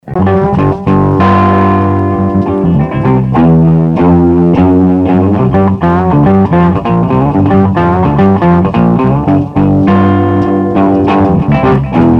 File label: Blues